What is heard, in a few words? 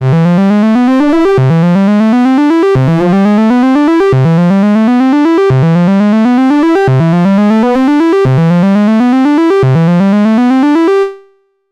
12dB SVF Notch Sweep Dist; GRP Module